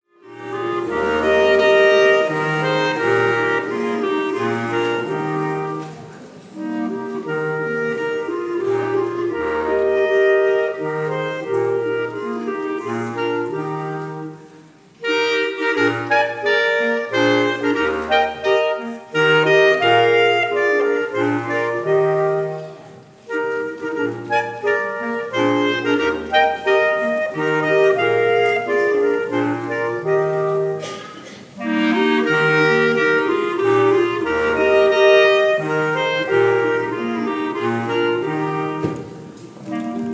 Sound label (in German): Hirtenmenuett